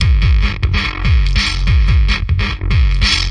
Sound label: beat, drum loops and breaks, 145 bpm; 141 to 160 bpm